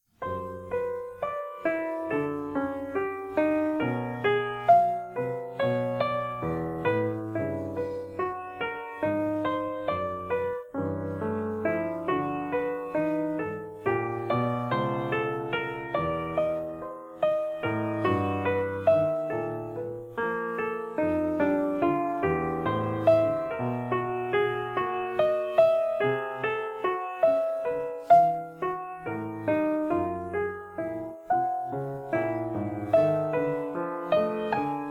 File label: Five Pieces for Piano